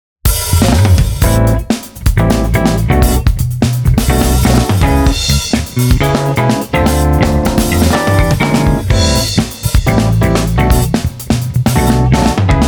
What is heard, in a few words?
psuedo bass